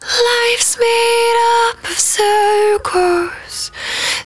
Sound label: 110bpm Ab, Velvet Vocal; Zero-G